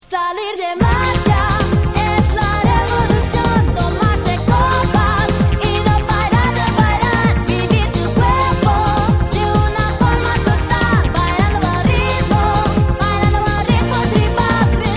tribal

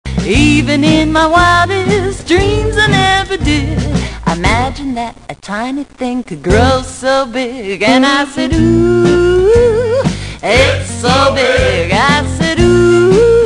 didgity

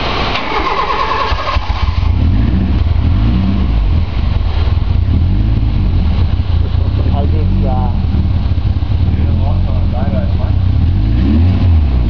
exhaust

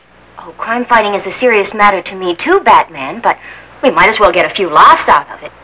laughs; Crime-fighting is a serious matter to me too Batman, but we might as well get a few laughs out of it.

